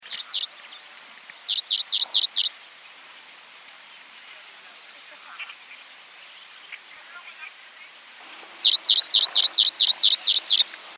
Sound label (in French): pipit de richard